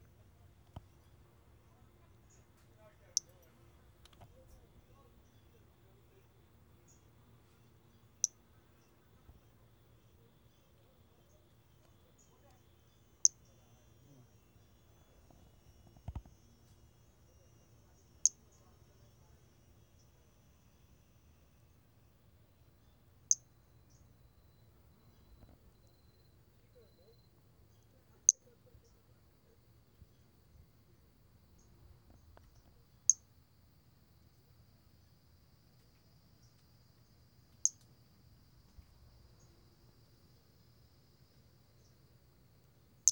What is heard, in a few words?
Brachycephalus albolineatus